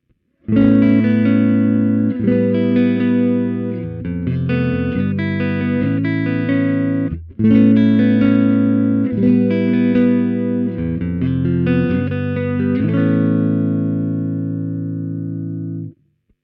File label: neck, mp90